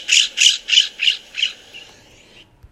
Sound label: scrub jay